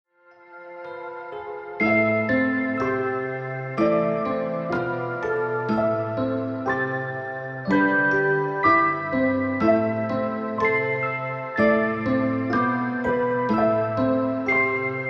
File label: Little Nightmare, Am, 123 BPM